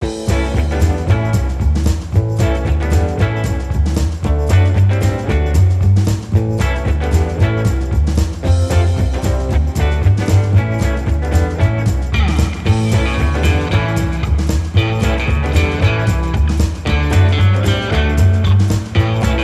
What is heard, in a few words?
jukebox; My Sims